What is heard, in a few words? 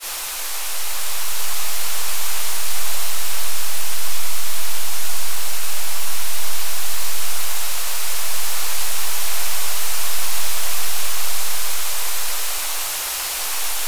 wind